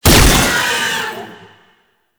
Fist Claw Spike Spawn; Weapons; Warframe; Tenno; Incarnon

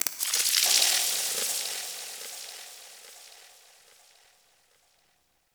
Corpus Ship Metal Movement Texture Small

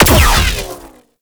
Shocker Fire; Warframe; Corpus; Corpus Railjack; Spec Ops Shocker

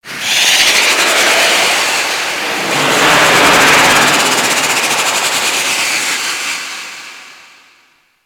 Pet Rocket Projectile; Alad V; Abilities

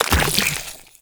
Step Sludge, Footsteps, Walk; Warframe; Tenno

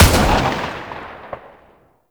Lato Remaster Shot C, Weapons; Warframe

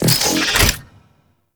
Iron Blast Equip; Warframe

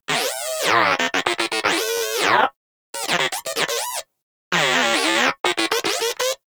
Inquisitive Vocal Interact; Pets; Warframe; Moa

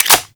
Grenade launcher Mech, Weapons; Warframe; Corpus

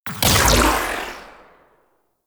2D Med Charged Tail; Crp BFG

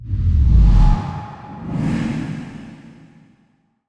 Menu Close; Drifter; Warframe; Cinematics; DuviriQuest; Courtyard

